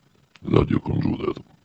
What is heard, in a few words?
jingiel; musician